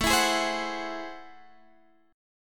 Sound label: A Minor Major 13th, down